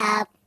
Jump, Robot voices; hedgewars

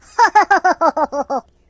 Laugh, voices Russian; hedgewars

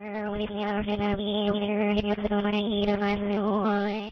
bee water; hedgewars

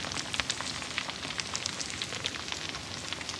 landgun

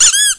rubberduck die; hedgewars

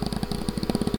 drill gun; hedgewars

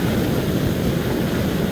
flamethrower

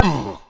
hell ooff

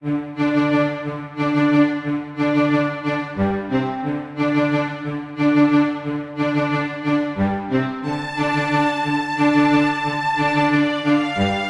pirate; hedgewars

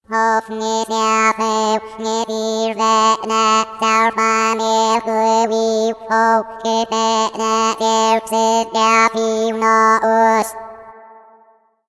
hog chant; hedgewars

